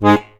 HOHNER, AKKORDEON; Best Service; Alpine Volksmusik

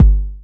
Yamaha CS6, Drums

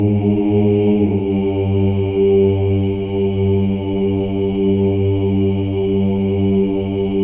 Voices; Spectrasonics; Symphony of Voices; HALF DOWN

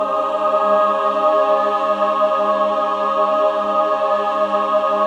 SUS G#4, SUS.CHORD, Symphony of Voices

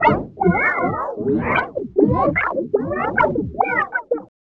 Rhodes FX; EZRollers

